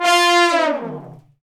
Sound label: brass section fat falls; Roland LCDP06 Brass Sections; Section FX